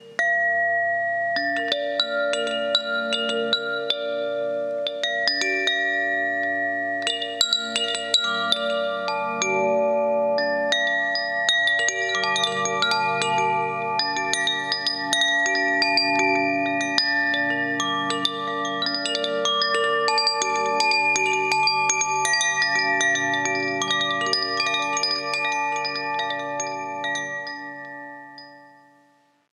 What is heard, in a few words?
alarm koshi; Mudita Pure